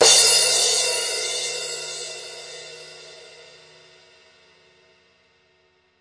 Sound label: crash 17 incher, DRUMS (SINGLE HITS), Crashes